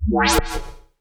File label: Tonal FX C